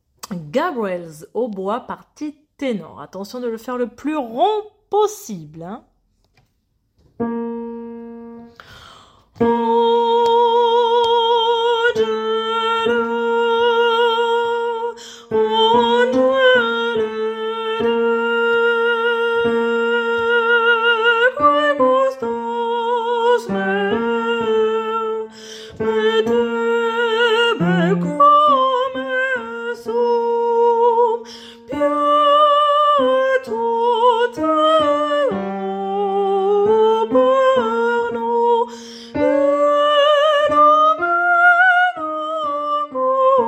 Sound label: oboe tenor